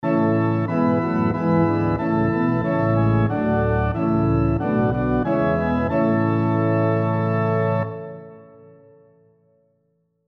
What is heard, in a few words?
SATB on Piano Example